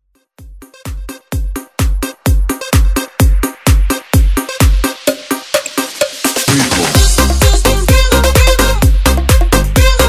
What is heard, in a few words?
House Bootleg